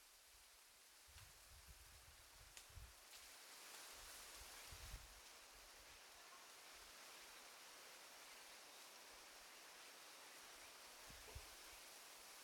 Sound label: wind insect